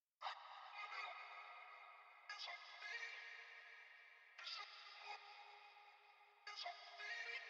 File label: 115 BPM F Min Vocals; Loop Stems; Cymatics; Lovin U